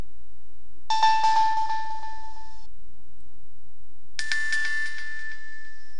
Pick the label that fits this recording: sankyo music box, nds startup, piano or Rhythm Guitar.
nds startup